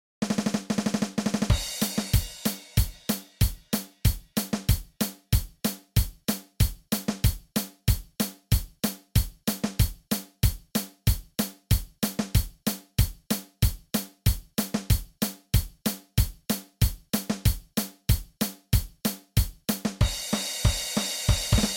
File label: Drum Kit; OSS 117; Percussion